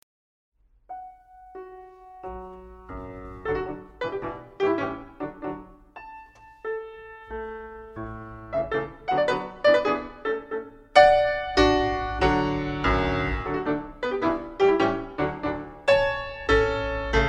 Sonata No. 15 in D Major - III. Scherzo. Allegro vivace; Beethoven; Op. 28 'Pastorale'